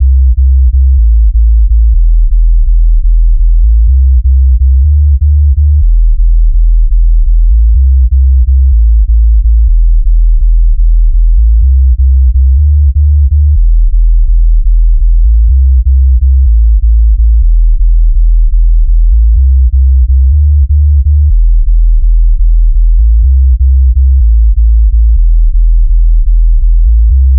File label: Synth Sub 124